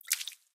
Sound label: Blood Splut